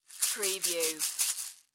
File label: Seasoning Bottle Shake; sound effects bible